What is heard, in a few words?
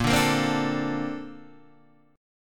Bb Major 9th, Bb, down, Guitar, DADGAD